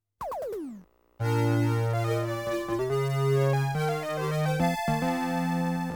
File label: Race Start Fanfare; konami game music volume 2